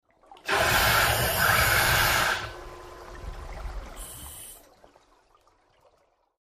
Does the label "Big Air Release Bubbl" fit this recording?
Yes